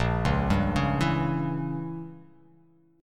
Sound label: A sharp Diminished 7th